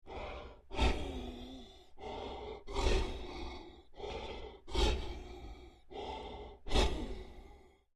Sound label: Creature Large Breaths Deep Laboured; Scary Breathing Sound Effects; Big Room Sound